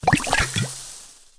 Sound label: Attack; Potion Kabal